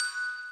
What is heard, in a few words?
Bells